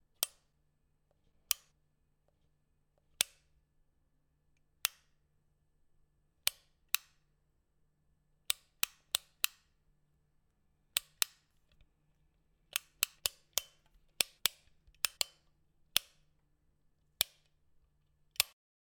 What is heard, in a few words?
Wall light switch